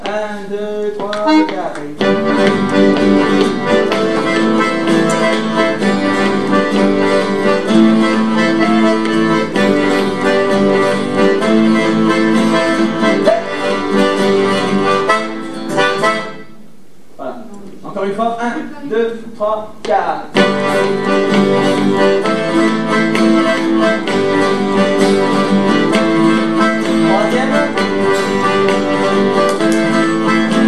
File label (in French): Accompagnement guitares; 2eme partie